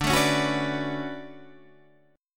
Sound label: D Major 13th D down Guitar Standard; strummed; JGuitar